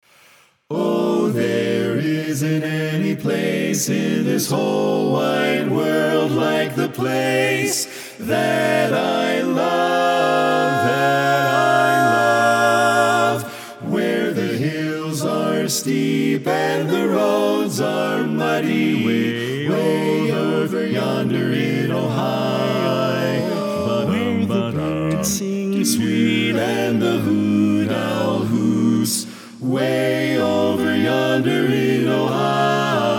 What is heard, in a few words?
Tenor Left